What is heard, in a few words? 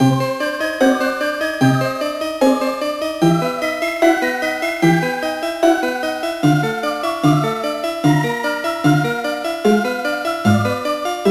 Clock Tower